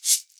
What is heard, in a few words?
MIXED PERC; 90 s Sample CDs